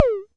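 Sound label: mattel tom, Mattel Synsonics drum kit, lo fi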